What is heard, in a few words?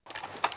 aa12 reload lift